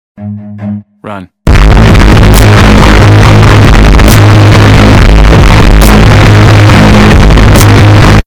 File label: bass boosted